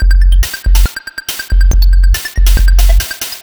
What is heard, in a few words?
Cheepo Groove 140, Lounge Drumz